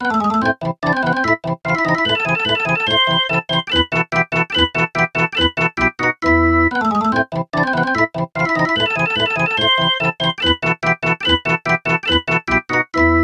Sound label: percussive organ mozart